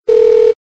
Boop Boop

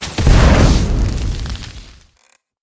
Blast Shot

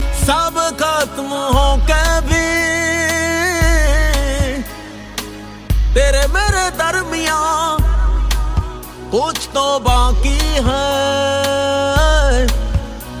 sad love tone

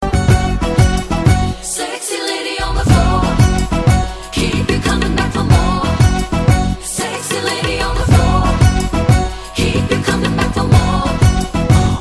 saxy lady on floor

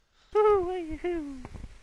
Creepy Giggle

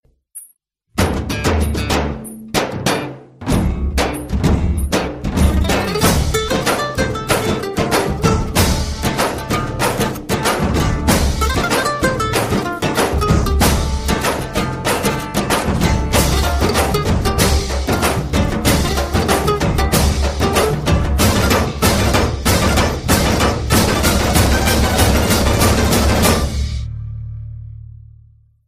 Guitar Drumming

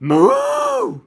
cow; dead; animals

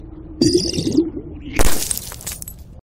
Clear Explosion

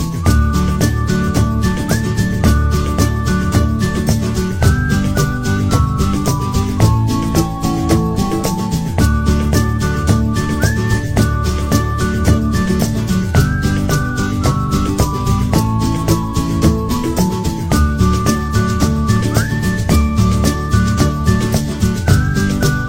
In Love Whistle

